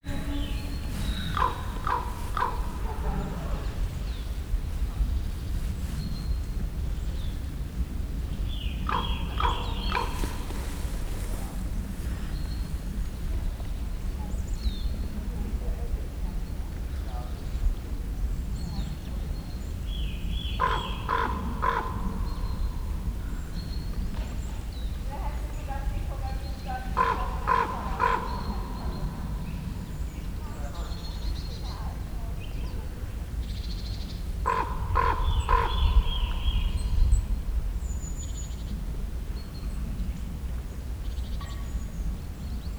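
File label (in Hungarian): egy helyben üldögélve szélcsillapítva, holló; termeszetben; szellotompitva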